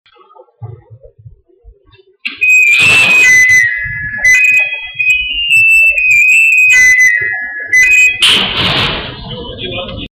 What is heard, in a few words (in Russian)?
metro